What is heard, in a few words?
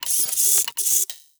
ROBOTIC Transformation Insect Servos Clicks Digital; stereo